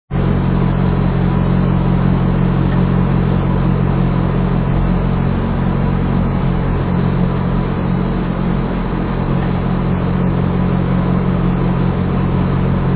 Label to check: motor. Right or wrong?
Right